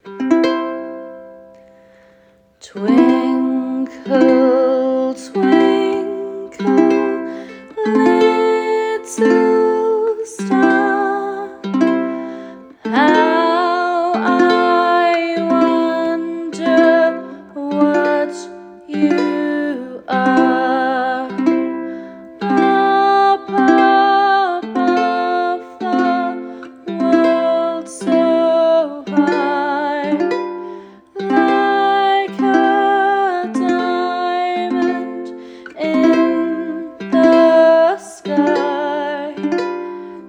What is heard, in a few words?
Uke; twinkle twinkle little star; Uke book